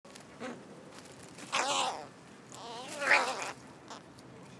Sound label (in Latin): Corvus corone; SETE